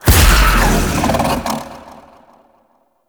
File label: kavat summon impact; Warframe; Khora